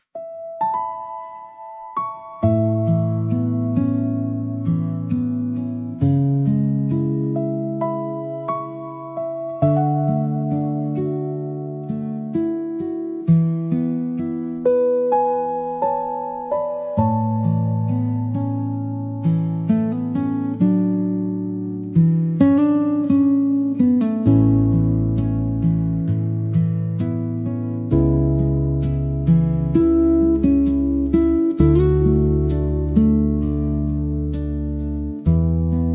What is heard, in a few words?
fantasy music nature forest